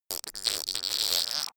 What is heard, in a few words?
fake fart